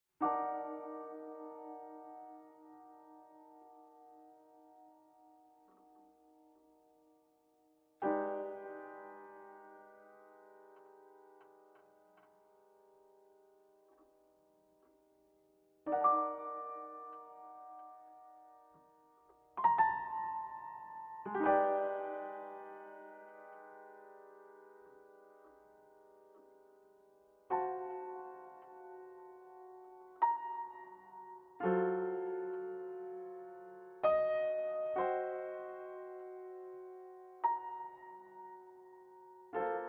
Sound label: tune